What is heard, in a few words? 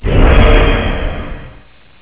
item health potion; Warcraft 3